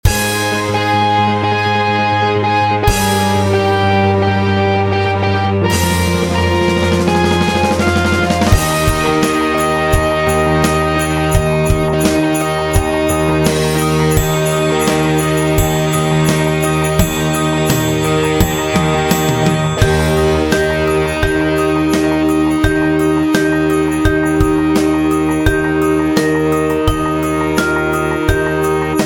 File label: Jingle Bells Practice Tempo E Flat